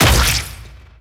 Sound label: suplex land